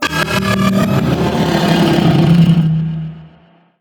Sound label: Arachnoid Camper Vocal Ability; Warframe; Corpus